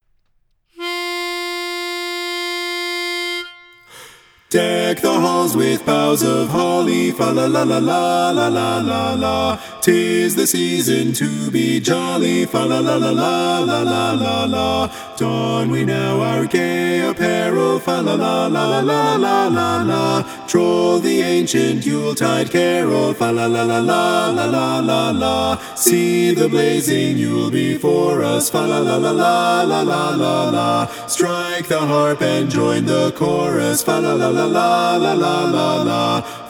Deck the Halls, lead missing; Christmas

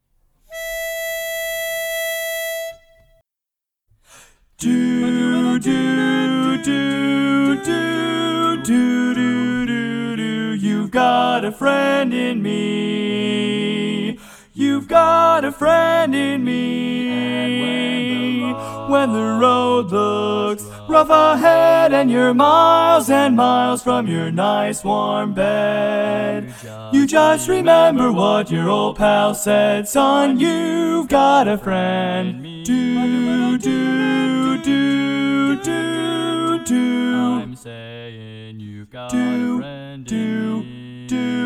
Lead mono in E

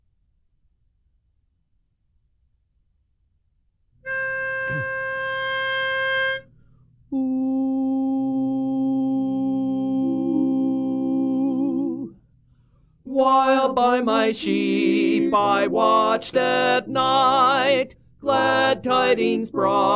While By My Sheep Lead; Christmas

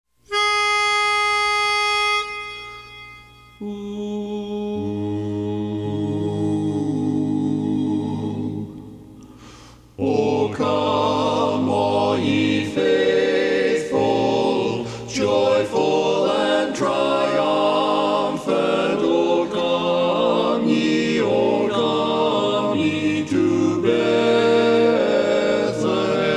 O Come All Ye Faithful Baritone; Christmas

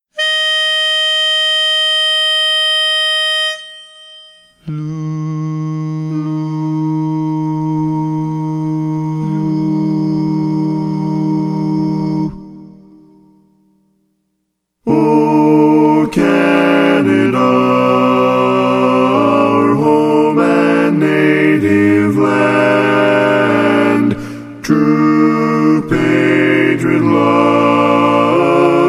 O Canada Lead missing

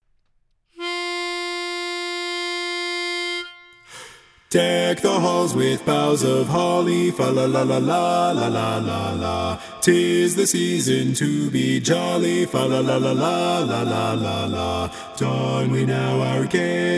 Deck the Halls, bass; mono; Christmas

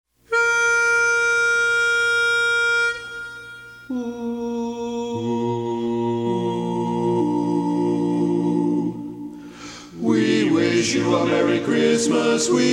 We Wish You A Merry Christmas, Baritone

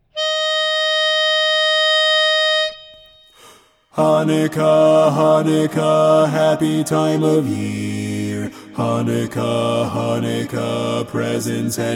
Chanukah, Chanukah bass mono

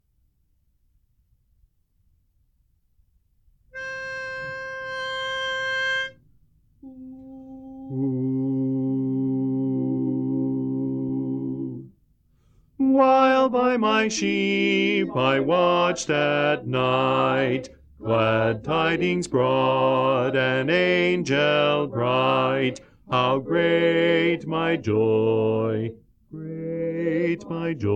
While By My Sheep - Bass; Christmas

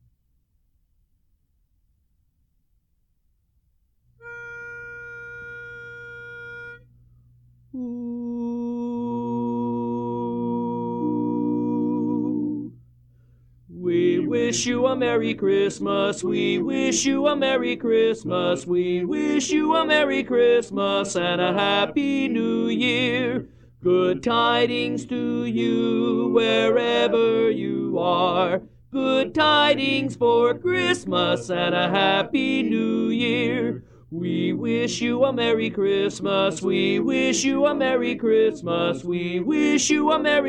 Lead; We Wish You A Merry Christmas

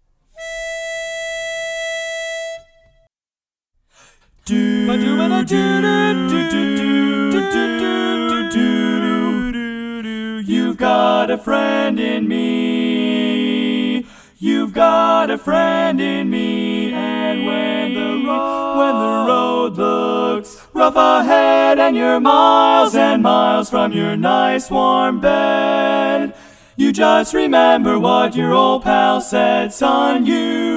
bass missing in E

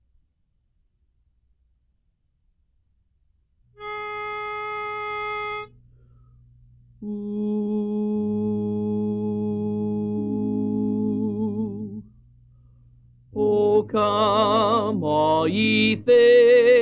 O Come All Ye Faithful, Lead; Christmas

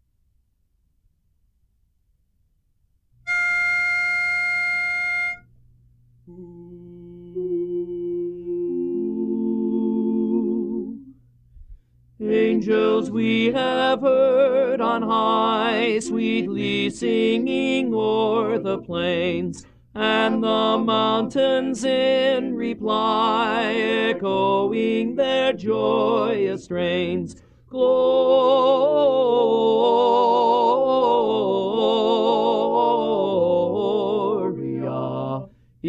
Angels We Have Heard On High, Lead; Christmas

